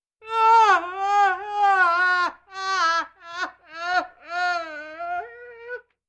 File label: Old man desperately crying